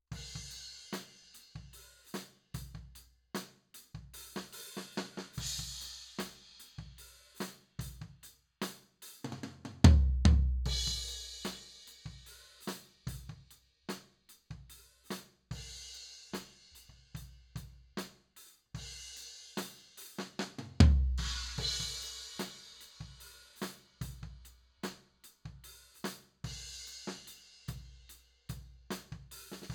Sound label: Floor